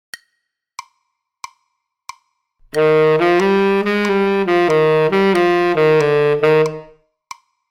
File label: Tenor Class Example H, Saxophone; Example 21h; Recordings For Beginning Jazz Saxophone Studies for Tenor, Volume 2